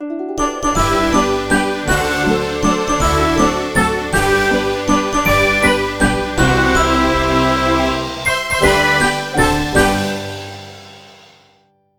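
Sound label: background music skill birthday; cake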